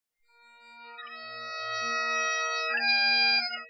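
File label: bagpipe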